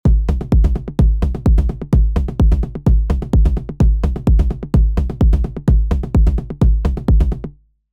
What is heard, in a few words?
Drums only